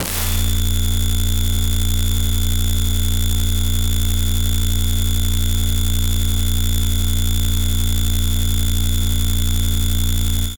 retrofuturistic computer glitch malfunction; BluezoneCorp

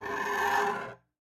Scratching knife on wooden table; Sound Ex Machina; SCRATCHES

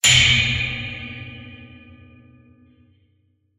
Metal Shelf Hit; The Metal Shelf

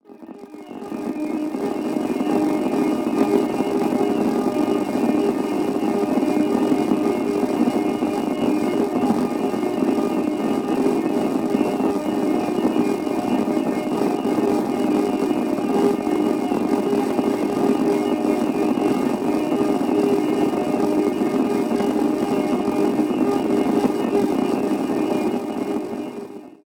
Granular Texture Gritty Noisy Atmosphere; Glitchedtones; Granular Textures

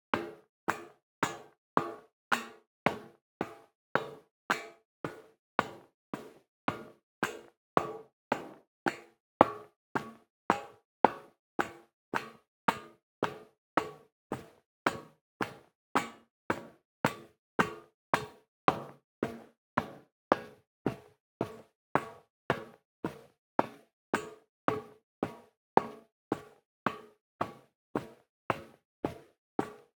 Footsteps - Metal Stairs - Up - 110 fpm - Loop; The Sound Pack Tree; Footstep Loops

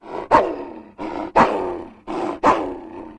angry loop, far; hl2

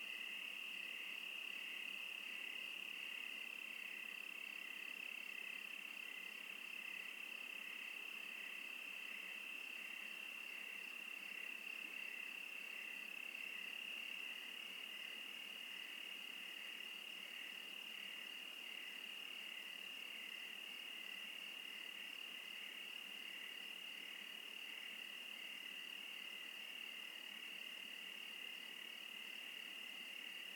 Crickets Interlude; Wilderness; Oregon

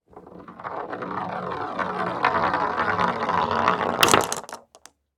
Marble Roll And Hit Marbles; The Sound Pack Tree

